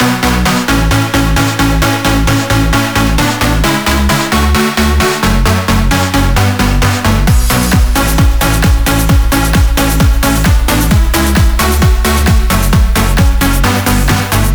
132BPM Elemental Mix

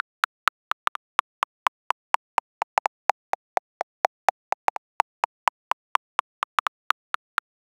126BPM Seq; Vengeance Samples; Inception Kit

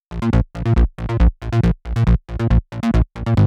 Bassline 138BPM, A#minor; Vengeance Samples; Fusion Kit

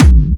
Bassdrums Clubby Kicks; Vengeance Samples

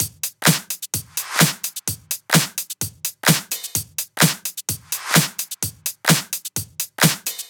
128BPM Drums; Vengeance Samples; Knight Kit

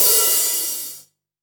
Open Hats, Cymbals

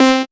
Synths C; vengeance essential club sounds-3

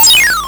Arcade Riffs

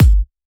Bassdrum; Vengeance Samples; VEE Bassdrums

